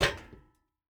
footstep boots metal jump land; Rust

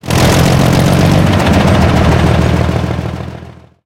pt76 engine load; War Thunder

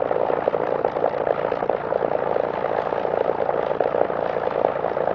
engine ai plane rpm 00 far; War Thunder; engines

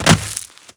stone land

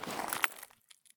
prone glass; Escape from Tarkov

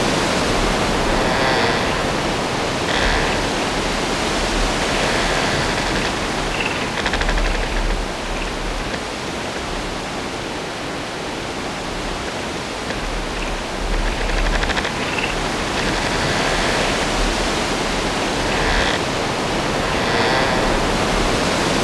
Amb Weather; Red Orchestra Ostfront 41-45

